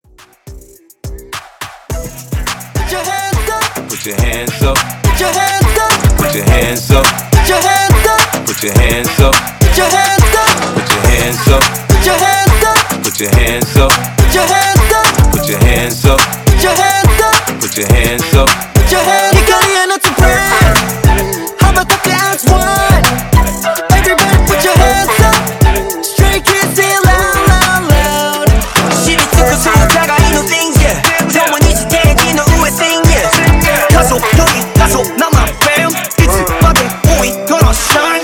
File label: Pop 105 BPM; Top40s ReDrum Ext.; Dirty Intro